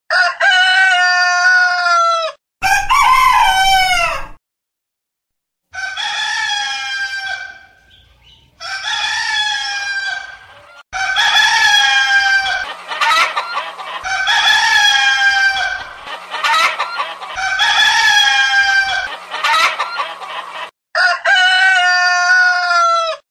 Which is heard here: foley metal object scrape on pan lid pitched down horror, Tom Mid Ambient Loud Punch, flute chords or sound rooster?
sound rooster